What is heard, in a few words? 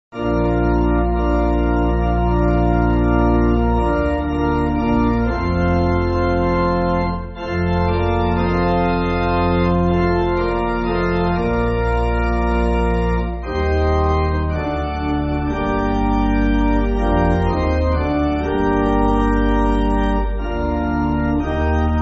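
Give Me The Wings, pipe organ; Mylon 8.6.8.6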